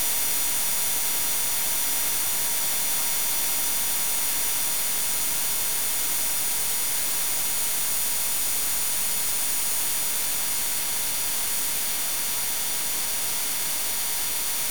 recording 10.029 ghz larger signal talk back asking to switch off